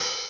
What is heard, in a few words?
cymbaly